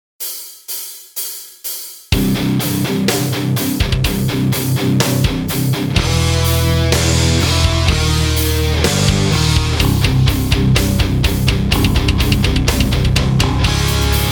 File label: Negative Harmony Metal Etude